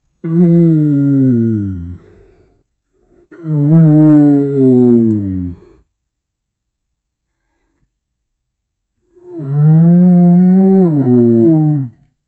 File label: a bear grunting; animal noise